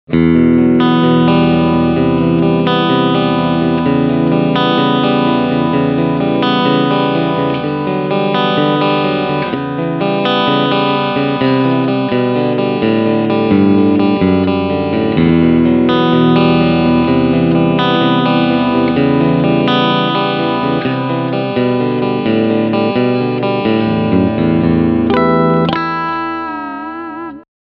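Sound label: Clean Picking Front Single coil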